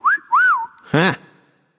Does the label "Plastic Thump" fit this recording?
No